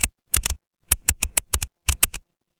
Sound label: office keyboard